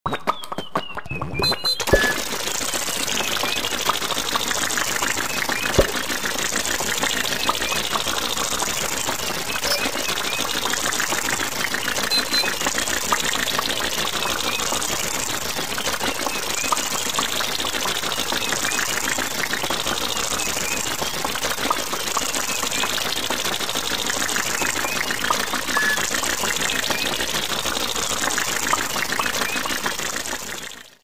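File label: cartoon car starts and runs funny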